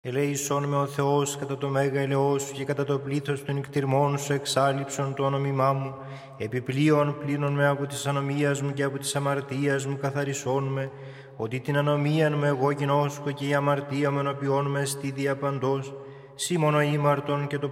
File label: Psalm 50, Monks, byzantine; Monks of Simonopetra Monastery; athos monks; hymns